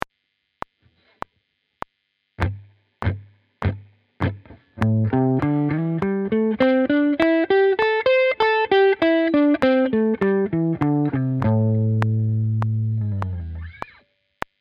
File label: blues, guitar